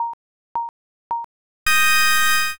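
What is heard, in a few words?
countdown new year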